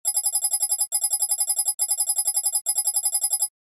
Scanner Pulsing Plin, drones

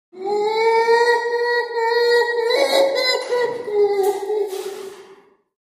Gorilla Howling Whim

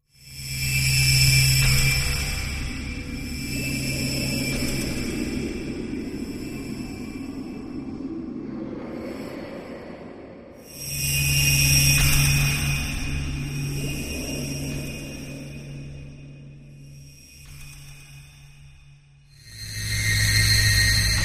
On Edge Machine High El, eerie ambience

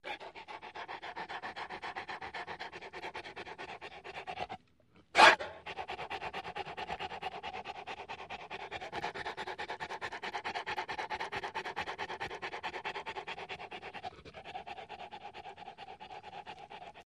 Dog Large Barks Snarl

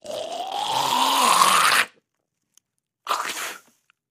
Snort Hawking Spit, coughing & spitting

